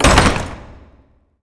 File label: door open; Fate Undiscovered Realms